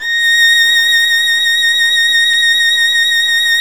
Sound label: STR VL A#7, STRINGS; Roland - LA Composer vol. 1